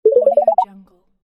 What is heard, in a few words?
fill